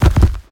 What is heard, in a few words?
Step Enemy Boss; MiSide